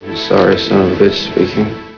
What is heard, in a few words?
sorry sob